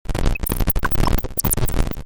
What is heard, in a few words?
noizzz